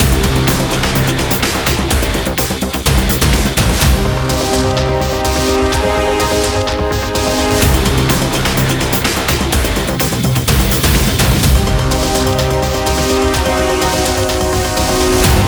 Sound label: Boss Fight; Alien Mothership